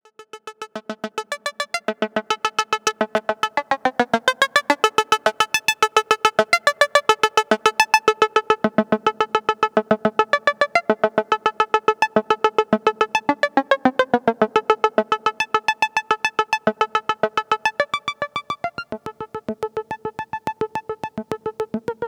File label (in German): Minibrute Sequenz; Vermona VSR 3.2